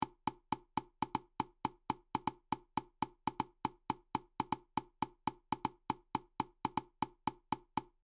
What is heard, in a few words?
5 9 euclidean